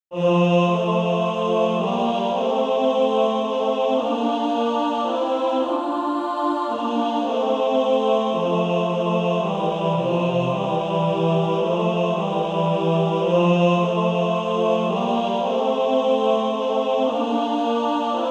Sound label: Male Choir TB; Sweet Hour of Prayer